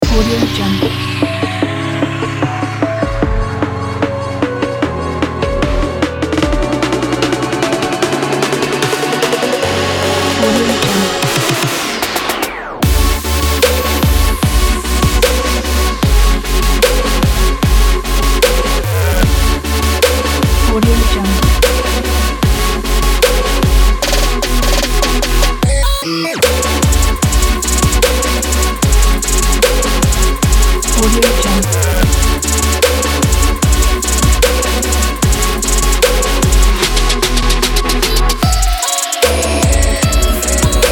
inspiring future bass

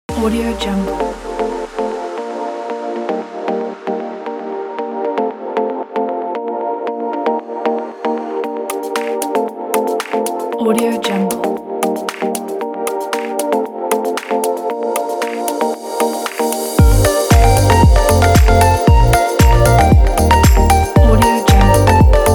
deep tropical house

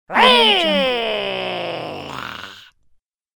bad gremlin whirr

